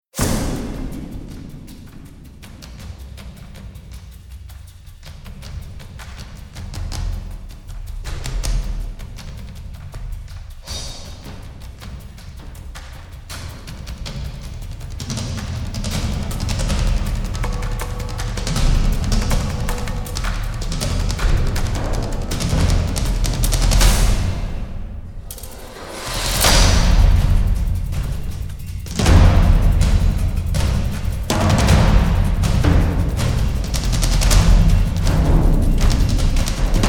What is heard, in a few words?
full length percussion only version